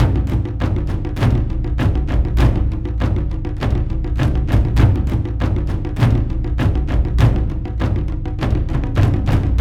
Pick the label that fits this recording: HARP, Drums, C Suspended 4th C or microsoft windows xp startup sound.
Drums